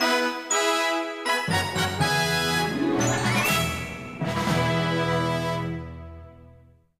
Rosalina goal music; SMM

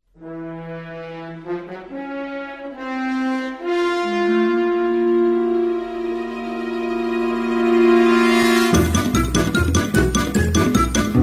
Portal Opens; M&LB; Connectar

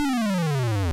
Bowser Down, Bowser's Death Music

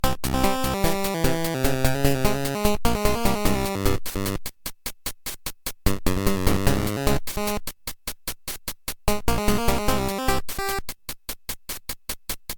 Boss Level Theme, Boss intro

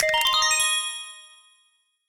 Game Over Retry; SM3DL

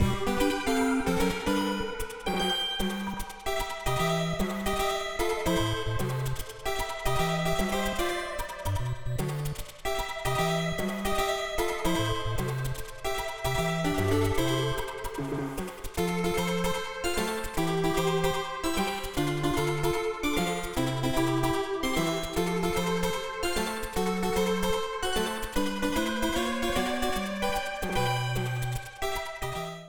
Ground Flip

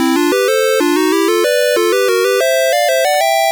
Jingle; Stage 10

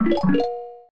Start Fanfare; Mario Paint

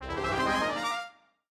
Power Up; SMBW; Elephant

